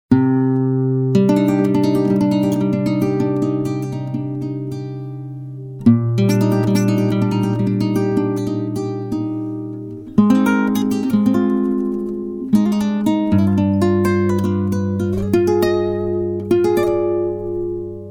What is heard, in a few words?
guitar, Classical Soul